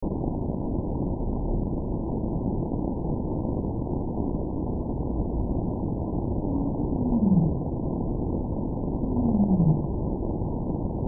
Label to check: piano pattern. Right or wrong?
Wrong